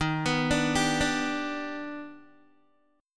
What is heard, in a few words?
Eb Augmented Major 7th Eb, Guitar; arpeggiated; 11,14,12,12,14,x; 4E,4A,5D,5G,5C,6F